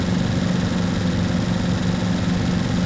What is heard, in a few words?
car engine driving; factorio 2.0.55